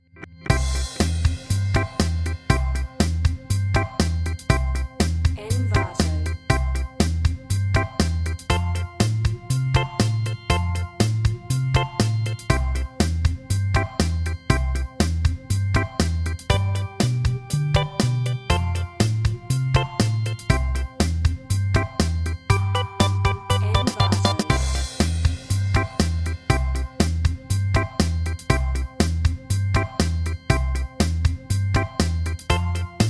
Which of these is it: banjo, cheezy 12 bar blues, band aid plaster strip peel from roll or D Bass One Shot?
cheezy 12 bar blues